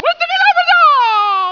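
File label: spin kick; mk2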